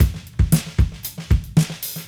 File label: Cruisin' Disco Drums, 70s Analog Funk; Sonic Foundry (Sony Creative Software); What it Is!